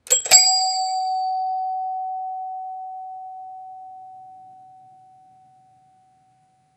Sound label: traditional mechanical doorbell